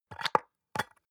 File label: soap dispenser press almost empty; haus-garten; badezimmer